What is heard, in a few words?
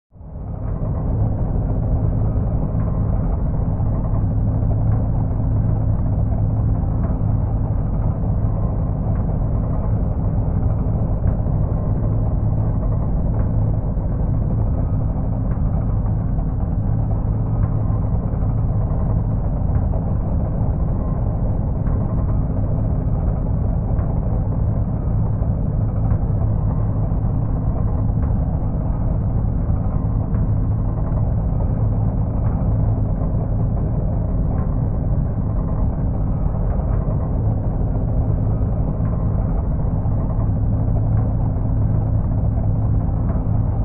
space station ambient